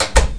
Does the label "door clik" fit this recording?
Yes